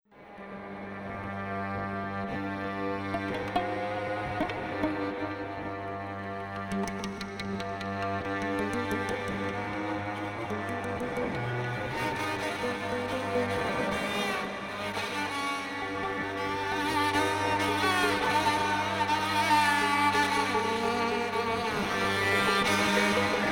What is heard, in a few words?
Trance